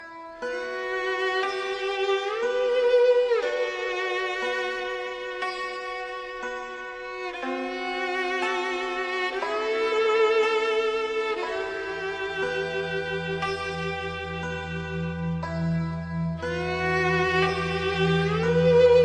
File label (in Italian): Classica Slow